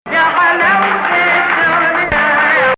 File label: Ajam; Zanjaran